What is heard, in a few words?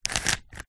leisure rubiks cube single turn